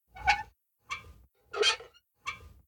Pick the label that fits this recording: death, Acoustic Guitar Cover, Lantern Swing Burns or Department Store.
Lantern Swing Burns